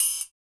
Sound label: Perc Toys; Spectrasonics; Supreme Beats; World Dance